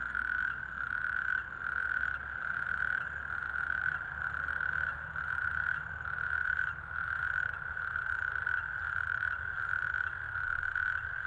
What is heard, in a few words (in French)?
crapaud calamite